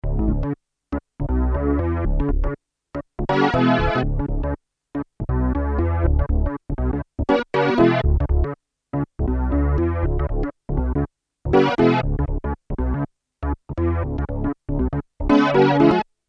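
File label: synth piano, analog polysynth; Solton Project 100; Solton SM100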